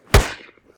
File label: mk19; mcheli